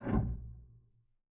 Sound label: Aircrafts Cockpits Gear Steer Lock In; DCS